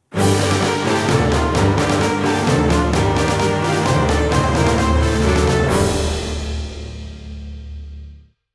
Green Earth National Anthem (Alternate); Advance Wars 1+2 Re-Boot Camp

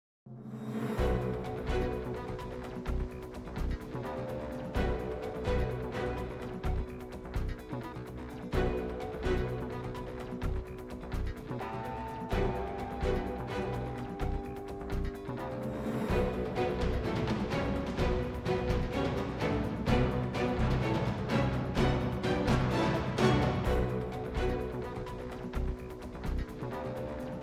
BGM Ingame Count; Gundam Evolution; Xbox Series X/S

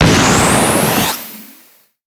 Sfx Ui Quest success; underworld ascendant

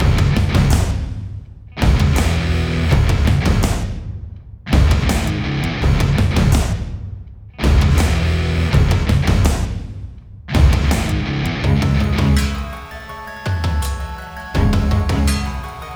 Iron Golem

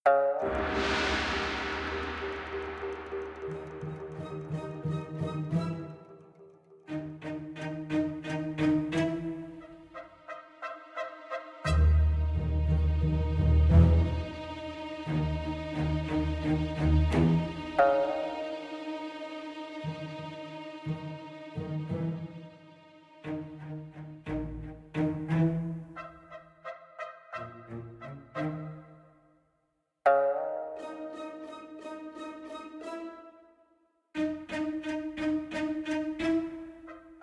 ninja tension

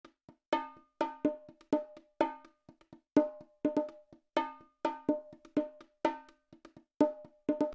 Item Shop; TTC Industrial Sector

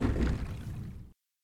vehicle speedboat turnoff

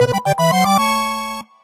Jingle Happy A Short; Pool Slide Story

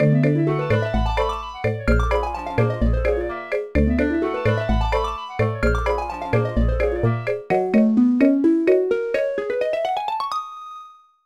BGM Balloon Takeoff; Irodzuki Tingle no Koi no Balloon Trip 2009 NDS gamerip

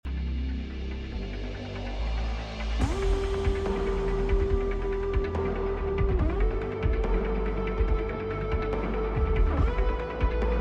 Battle Forest

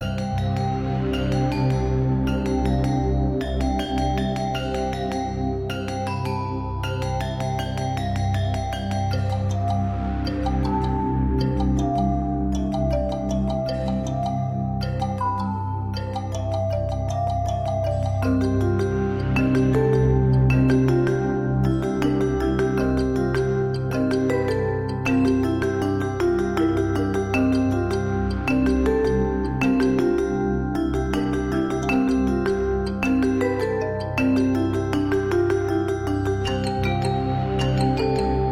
Unused Puzzle (No Percussion); Skylanders 3 Swap Force; Cascade Glade

